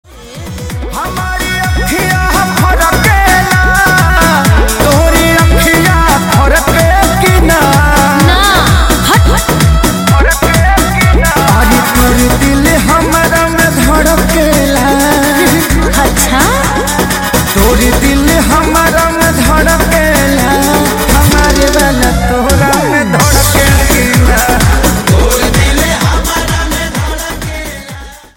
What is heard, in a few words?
Ringtone